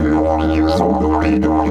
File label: 140, DIDGE RIFFS